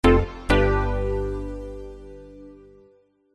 multimedia game sound bold warm 70s retro synth positive gain award